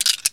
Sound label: Gems Few; little robot sound factory